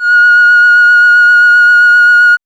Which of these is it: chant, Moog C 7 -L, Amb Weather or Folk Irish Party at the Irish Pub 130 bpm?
Moog C 7 -L